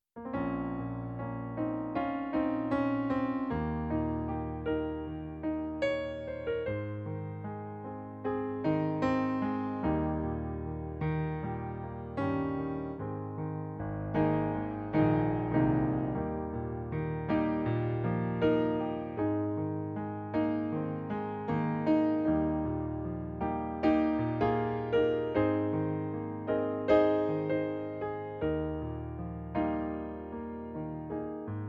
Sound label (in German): Pianoplayback Ab-Dur